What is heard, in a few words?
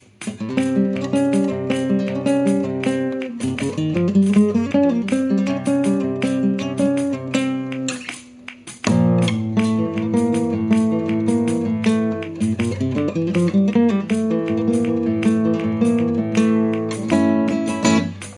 Bulerias